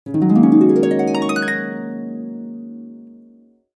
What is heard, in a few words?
Harp glissando